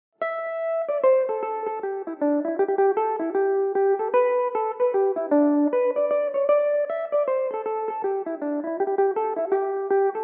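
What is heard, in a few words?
G major, Irish mandolin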